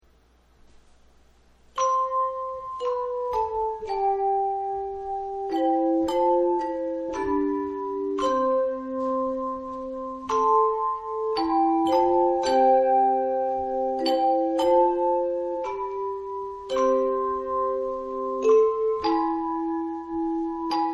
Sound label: Chimes